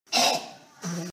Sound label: cough